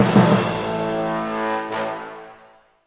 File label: Music Boss Battle Outro Stereo; Plants vs. Zombies Battle for Neighborville; MushroomBoss